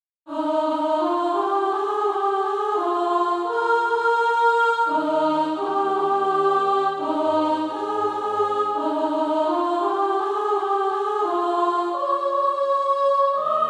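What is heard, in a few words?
soprano, Practise Music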